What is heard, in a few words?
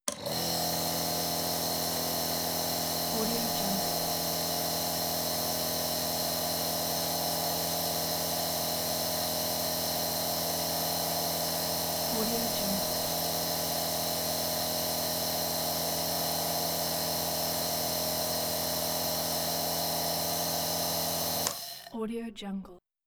inhaler